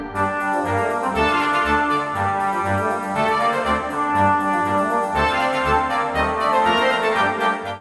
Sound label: Kid pusuit